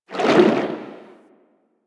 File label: Shark Tail Move Swim; Ocean Shark